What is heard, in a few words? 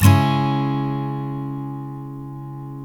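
STL C# Minor DN, Guitars steel chords; Spectrasonics; Hans Zimmer Guitars VOL-1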